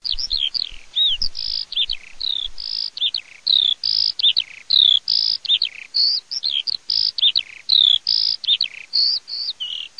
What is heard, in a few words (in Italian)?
allodola, birdsound